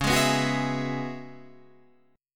E 6th Add 9th C sharp

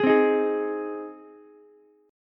C Minor Sharp 5th C down Ukulele; strummed; JGuitar

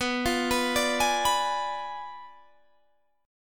B Diminished 7th, Guitar, Half Step Down

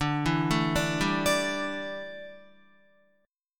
D Suspended 2nd Suspended 4th D down Guitar; arpeggiated

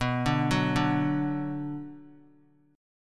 B-Minor; arpeggiated; JGuitar

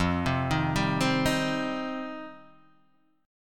F 7th Suspended 2nd F Guitar Open D

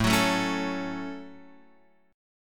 A 6th, Guitar, Open F; strummed

